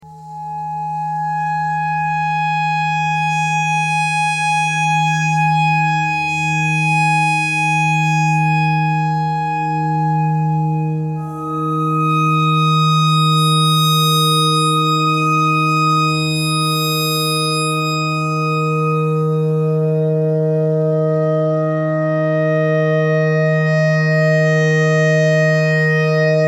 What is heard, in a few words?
clarinet notes e clarinet notes e pentatonic